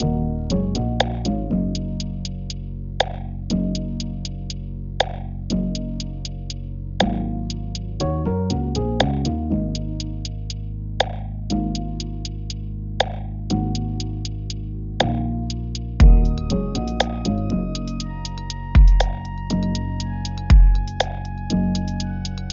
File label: Lo-Fi Phat & Lazy